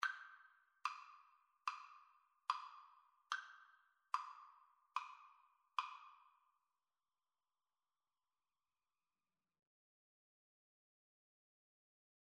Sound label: Double Bass Duet